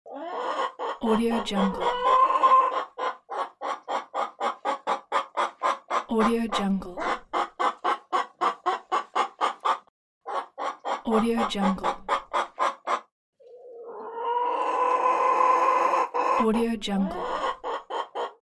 chickens

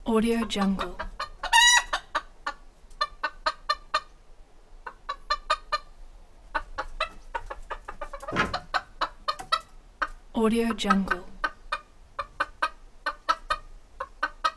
chickens

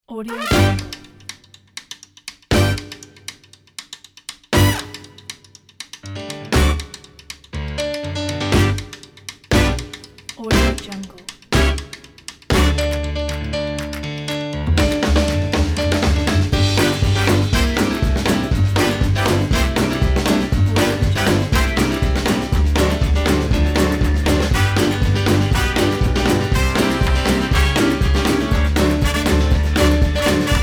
gospel jam; uncle dooney tunes

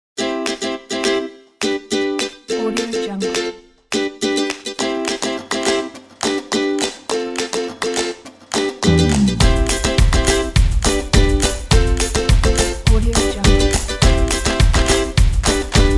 upbeat happy

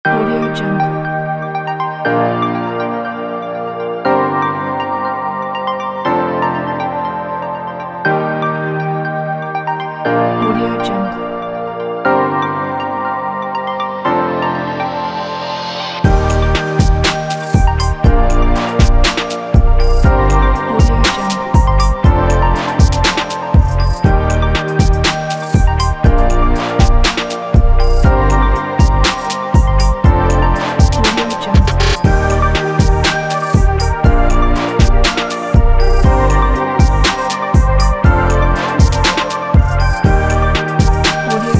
hiphop background kit

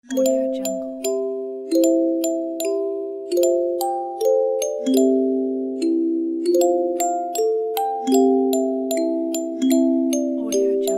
jingle bells music box; vaanfomm

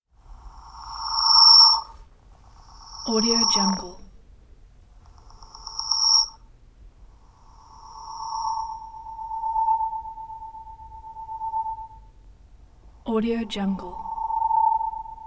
sci fi feedback

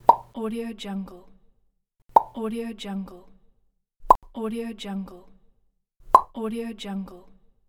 bubbles

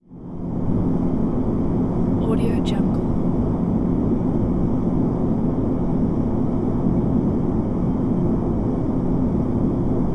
submarine ambience; sounddivision

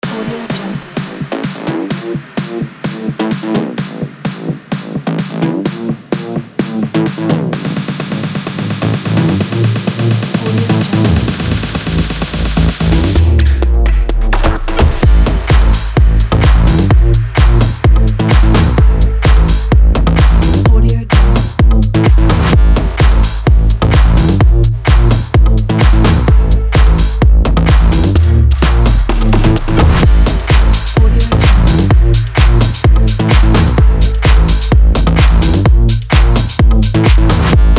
techno

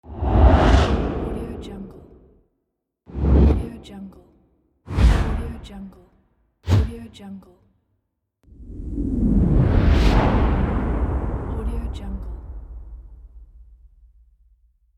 whoosh; sfxroom

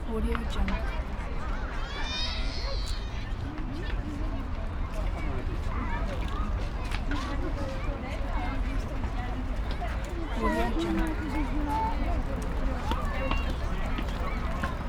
city pedestrian street ambience

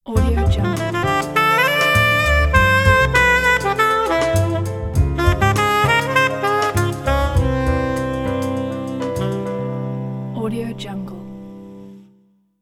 whispering tenor saxophone logo

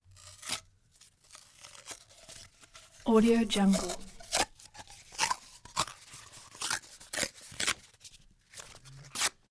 tear paper tube hollow ripping

